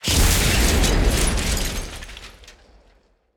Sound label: explosion material unit; War Thunder